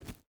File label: footstep rubber boots forest jump start; Rust